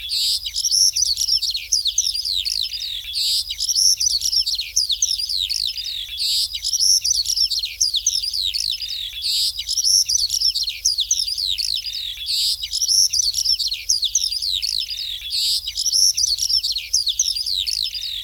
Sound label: Birds